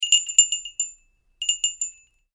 熊よけ鈴

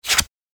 カードめくり

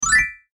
チェレスタグリッサンド

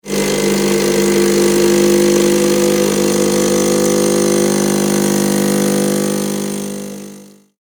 家庭用エスプレッソマシン動作音

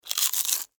お菓子をかじる

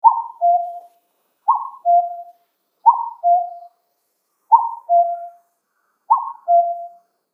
カッコウ

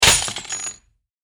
破壊音 軽い

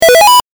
8bit 獲得

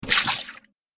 水を捨てる

